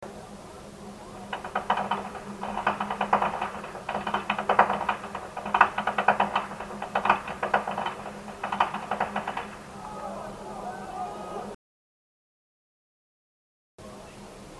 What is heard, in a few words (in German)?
schnalzen, jugend; leustetten